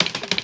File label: perc clatter, Drums, One Shots; Percs; Slate; SD AURORA Sample Pack